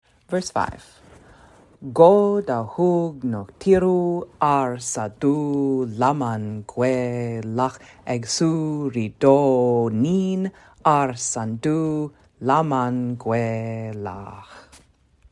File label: Chant